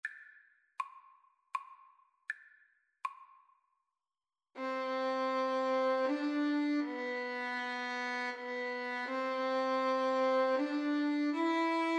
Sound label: holst jupiter, oboe violin